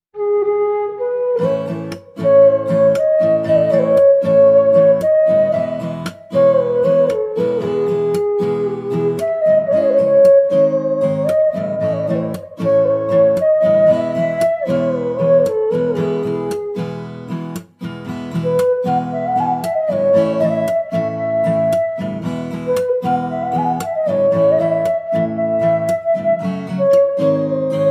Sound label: Flute ringtone